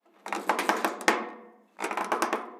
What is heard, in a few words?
metal stress; survival